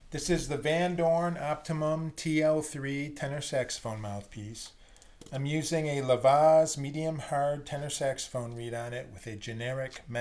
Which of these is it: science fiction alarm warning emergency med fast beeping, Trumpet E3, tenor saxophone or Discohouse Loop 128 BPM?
tenor saxophone